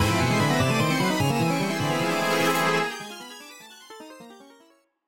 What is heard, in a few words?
RPG Start